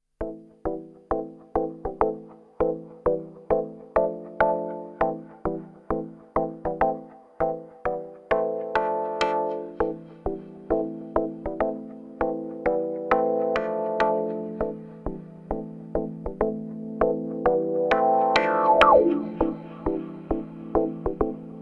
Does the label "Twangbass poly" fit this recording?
Yes